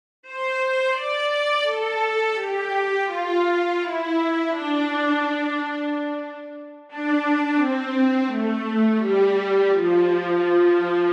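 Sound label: VIOLE legato, violas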